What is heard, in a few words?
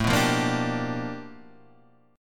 G 7th Sharp 9th A chord; G7#9